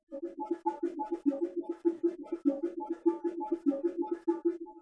Bongo

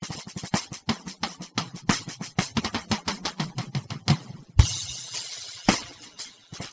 Drum Solo

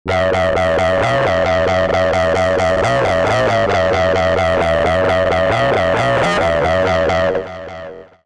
Synth Mono; WSOLA; TSMtoolbox

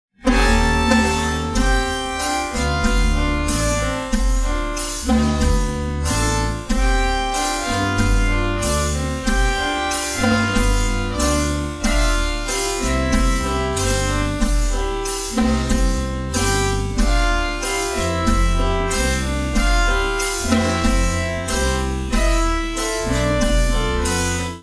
Jazz

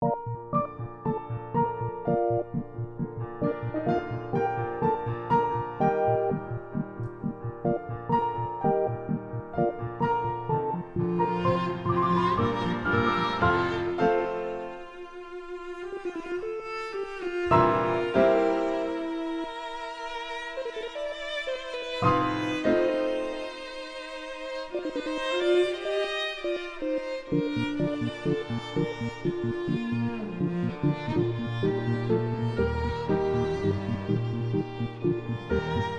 Sync Sine Soni

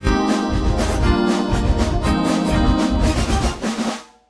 Pop; TSMtoolbox

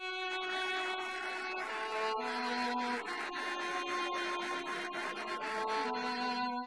Castanets Violin Applause

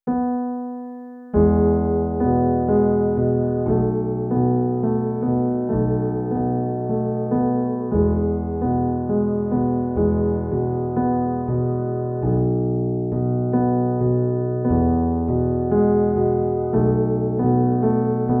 Chopin leftHand Reference; Op010-03

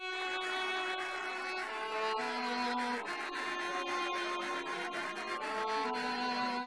Castanets Violin Applause

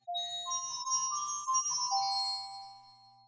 Glockenspiel

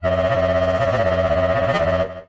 Synth Mono

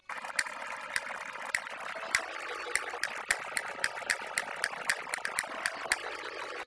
Castanets Violin Applause

